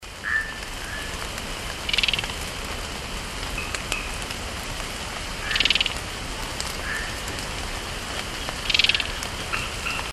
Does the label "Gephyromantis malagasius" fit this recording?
Yes